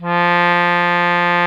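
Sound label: CONCERTINA; E-MU Formula 4000 Series Vol. 4; Earth Tones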